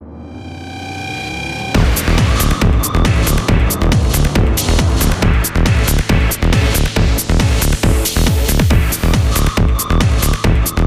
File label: Goal 4th Elec; Gran Turismo 5